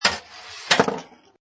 Pinball Sound Ball Drops On Rail Rolls And Falls Off End; Video Game Sound Effects